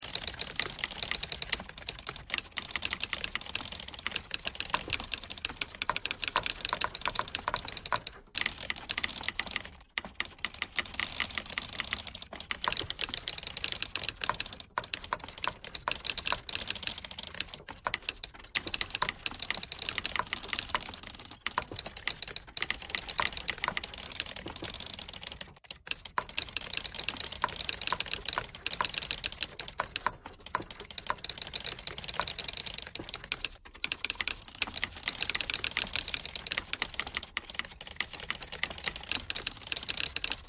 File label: typewriter thread all